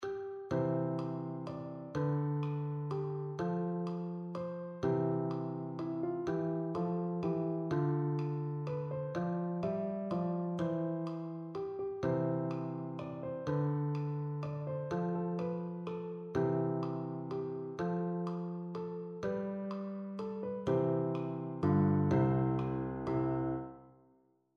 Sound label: vals